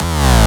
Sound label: Bass Shot G#